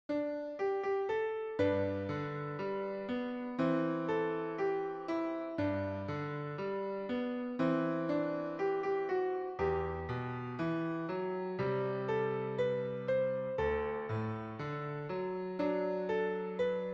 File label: The Water is Wide TIN-Piano